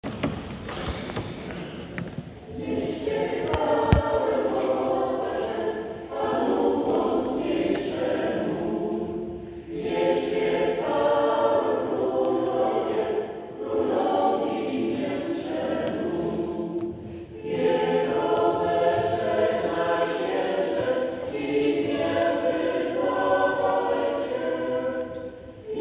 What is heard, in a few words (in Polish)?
Chor Parafii; Tymbark